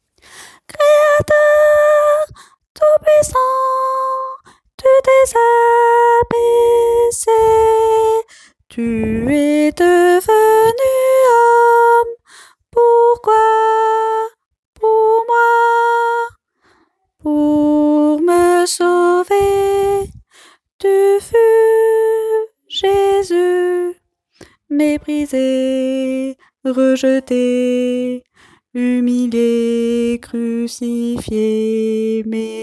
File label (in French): basse; chant 167